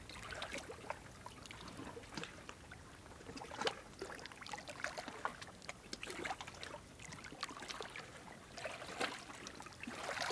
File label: sea waves